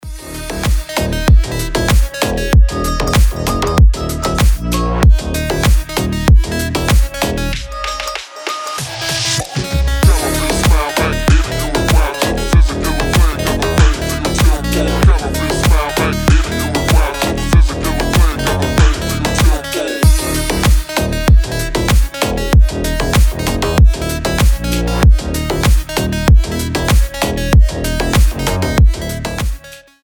arabic night

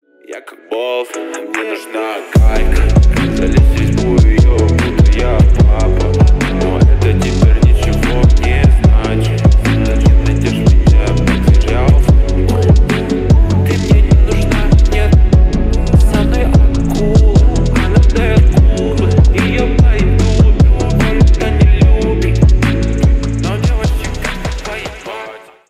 phonk remix

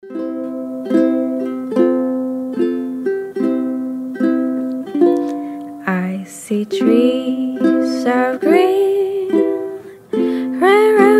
live acoustic

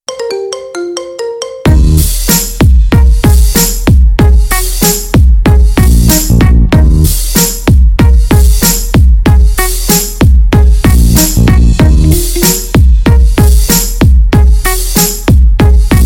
marimba remix

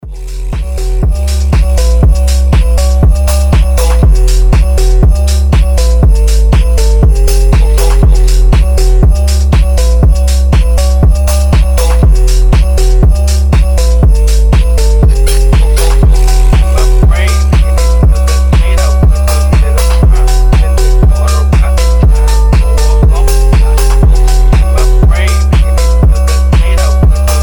phonk

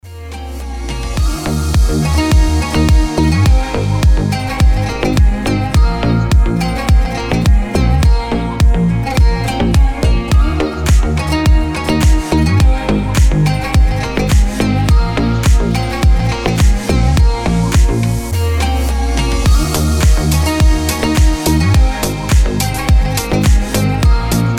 fire instrumental

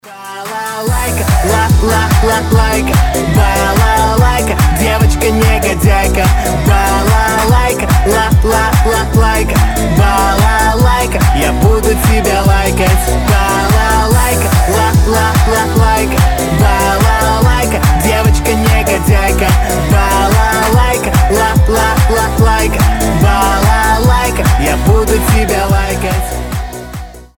balalaika

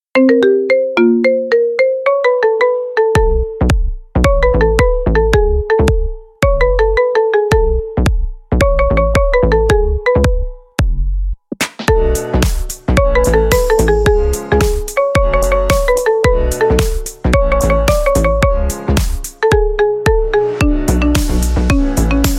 marimba remix

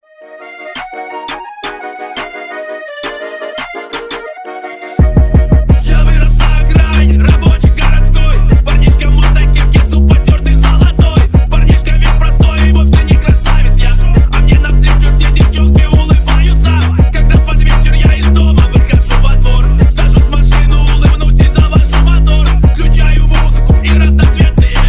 phonk remix

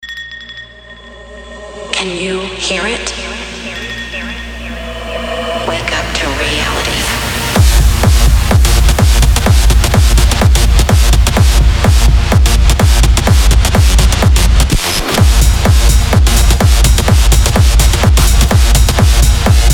rave alarm

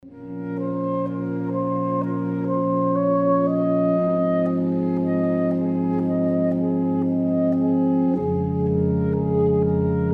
cello octet

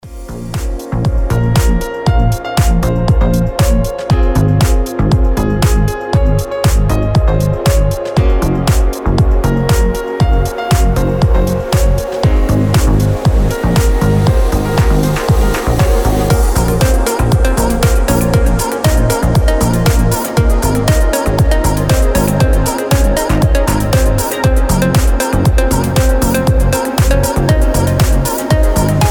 instrumental mix

